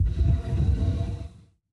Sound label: Dragon Wind